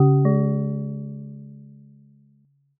china gong

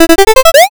pico question

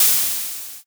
steam

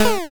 damage

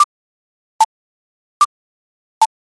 ticktock

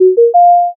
success

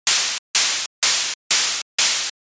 saw

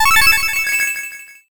jackpot

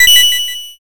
correct

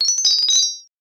kagura suzu

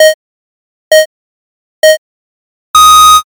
countdown

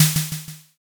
snare

